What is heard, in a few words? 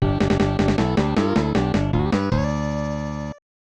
Unused Jingle; Same Game; Mario